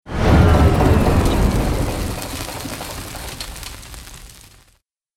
engine fire damage

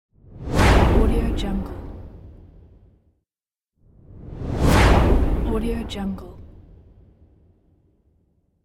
rocket

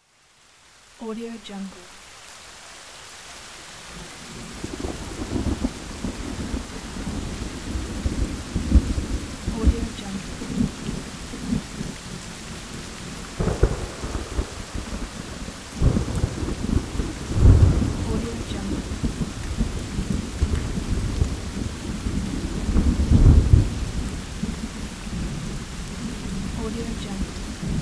rain and thunder

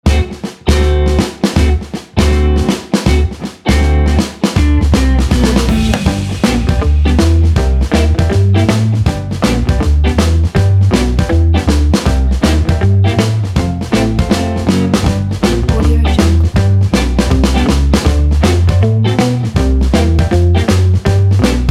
coming back home ident

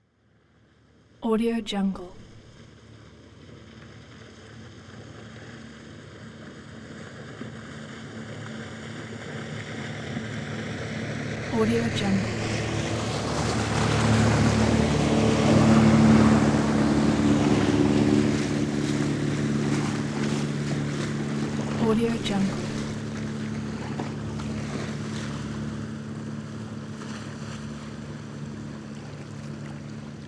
boat pass by

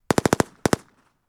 gun hk mp5; pov

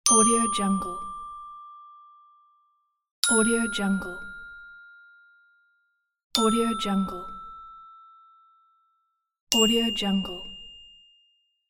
ding sounds; medusamusiclab

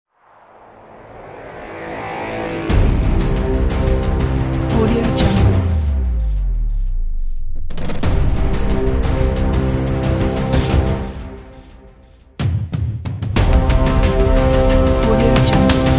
trailer cinematic ident; studio-art-design

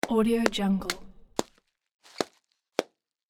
package bubble wrap pop

